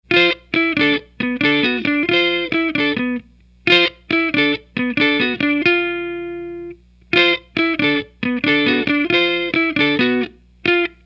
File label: Strum Latch